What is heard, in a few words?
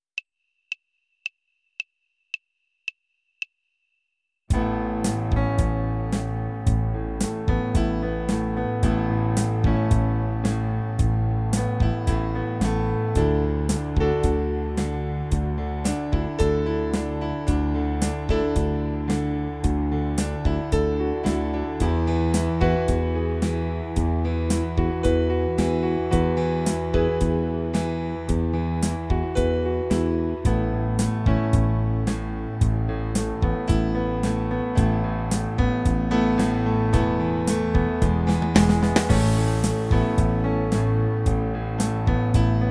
Guitar Progression